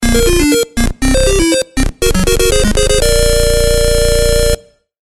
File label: Bgm Retro; Run Run and Die; PS Vita; Wii U